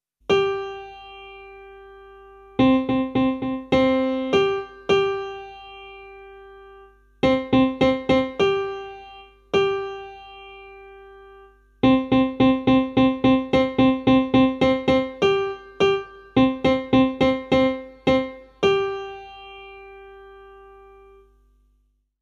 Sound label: music